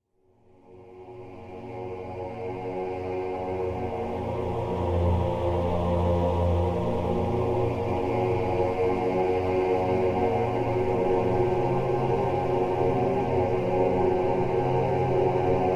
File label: Ambient